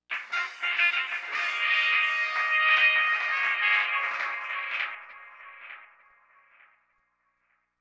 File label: Stab; Thirty-One Flavors